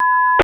Tone; BNSF

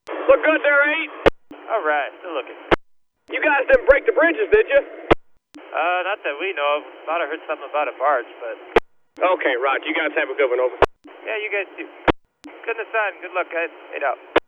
Bangor Roll By; KCS 4107

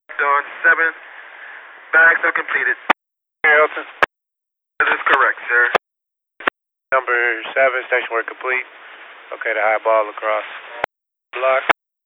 Amtrak

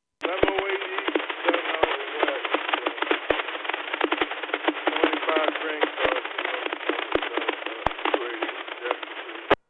Amtrak; 1108 Donehower

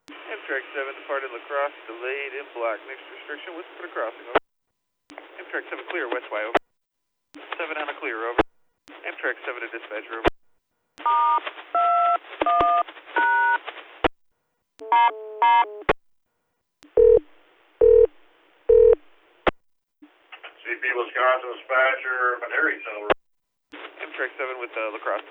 Amtrak

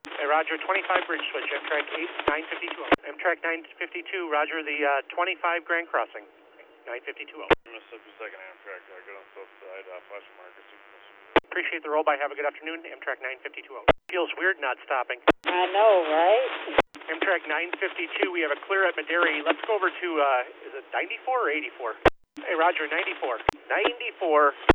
Amtrak

